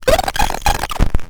Miscellaneous Glitch

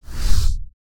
Swoosh Transition

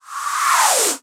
Synthetic Blow

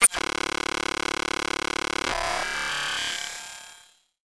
Digital Buzzing Rattle Glitch

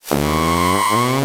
Digital Buzzing Rattle Glitch

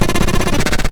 Miscellaneous Glitch

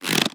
Elastic Rubber Creak

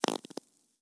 Rubbery Rustle

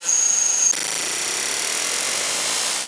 Digital Buzzing Rattle Glitch

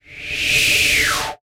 Synthetic Blow

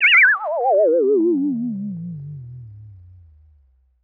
Cartoon Squeak

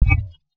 Single UI Blab

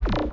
Sci-fi Pulse Gun Blast

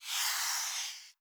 Soft Slide Long

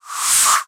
Synthetic Blow

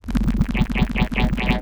Sci-fi UI Rumble

